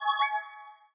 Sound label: Chat Out; ZTE Z2332CC